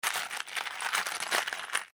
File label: Office Box Push Pins Remove Pin; Erasers (Sound Effects:Offices)